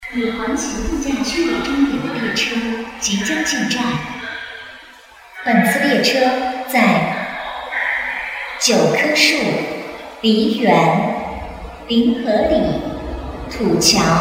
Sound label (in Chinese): 八通线 环球度假区方向 快车 进站